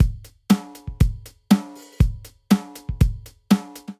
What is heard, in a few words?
Jazz Brush Kit; Drum Kits; Ableton; Drum Booth